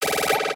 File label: electronic burst; короткие; на смс; на уведомление